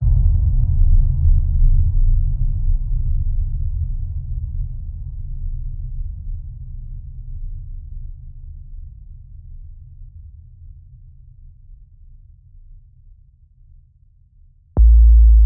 One Shot Room Hit

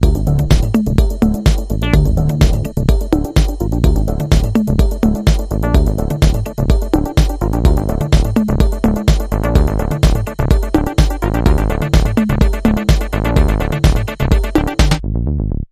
Electro Vibes

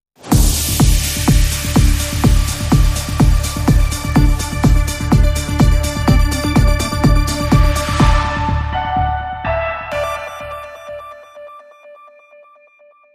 Logo Only

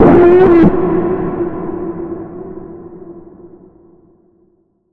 Bend, F

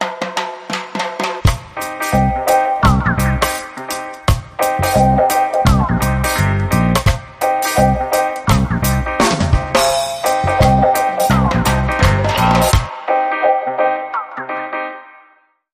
Caribbean Carnival, Sting

